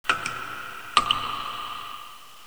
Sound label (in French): grotte